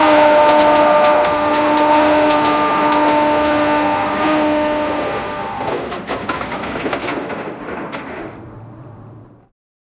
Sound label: industry